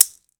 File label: MEXICAN, Latin Shakers